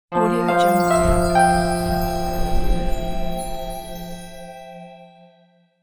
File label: short chapter intro stinger; fxprosound